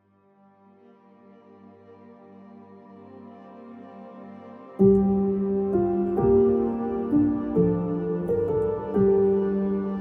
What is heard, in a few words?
piano